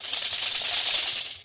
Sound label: snake rattle